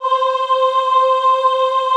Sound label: AHH C4, Classical Choir; Best Service; Extended Classical Choir